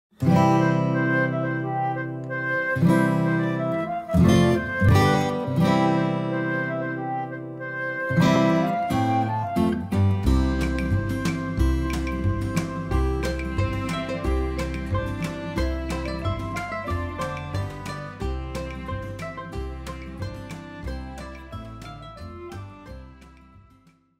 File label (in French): Il est ne version instrumentale